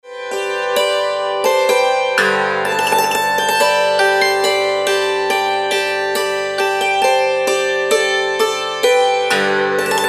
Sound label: trumpet voluntary